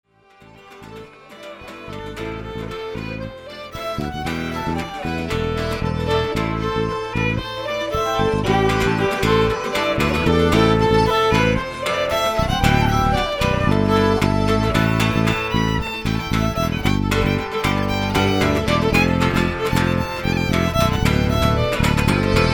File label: bass